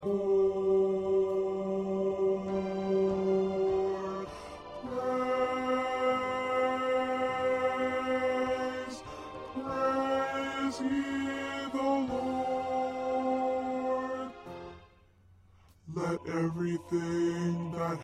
Bass

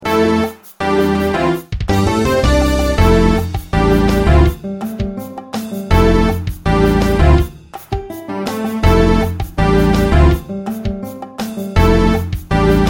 Accompaniment

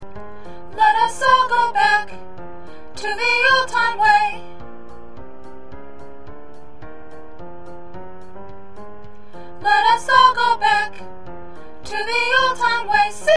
Soprano, choir

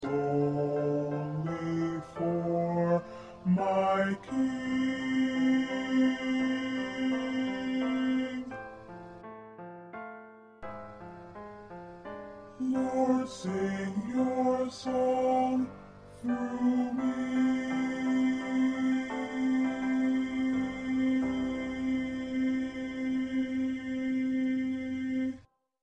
1st Bass

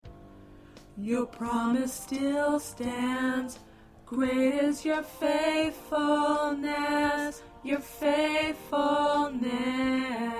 Tenor

